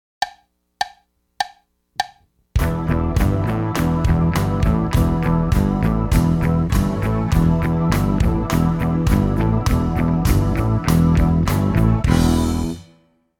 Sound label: cross my stick, drums